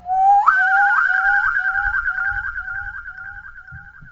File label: SPOOKY